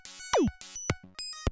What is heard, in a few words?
clunk